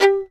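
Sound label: fiddle staccato G4; Sampleheads; Whole Lotta Country 1 & 2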